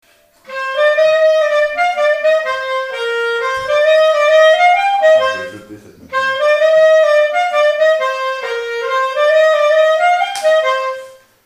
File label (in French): mazurka variantes, clarinette